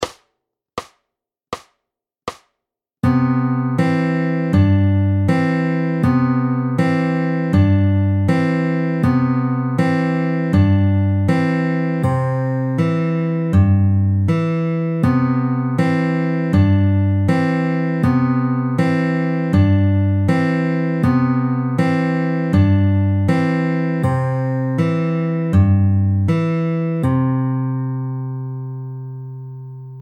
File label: Slow, fingerpicking